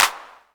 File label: clap; foxdot default